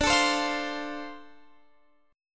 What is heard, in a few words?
D-Minor 7th D, chord